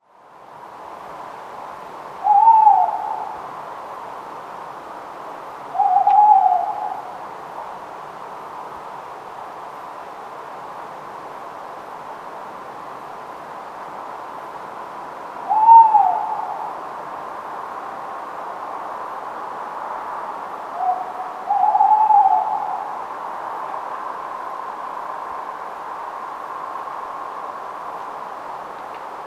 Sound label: tawny owls somerset